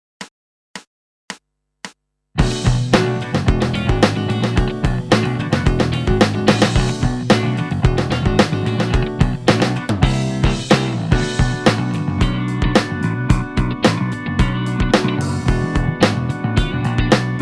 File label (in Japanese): ラン奏法入れまくりの怒濤のおしゃれブルース･フレーズ